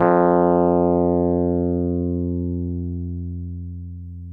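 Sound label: Wurlitzer electric piano; Sonic Reality - Vintage Timetraveler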